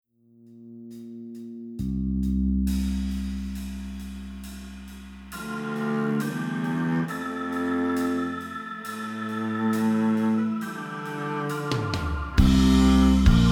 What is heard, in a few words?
rhythm